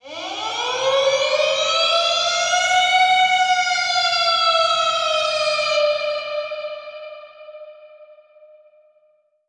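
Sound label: Echo Processed, alarm siren samples 125bpm; musicradar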